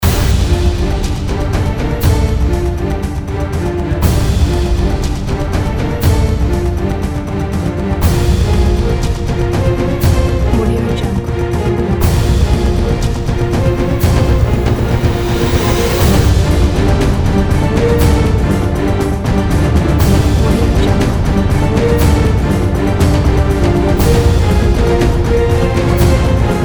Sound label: war game loop